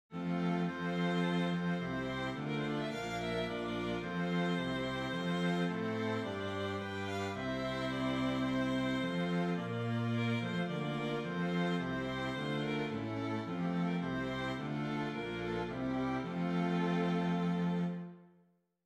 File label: Strings; Hymn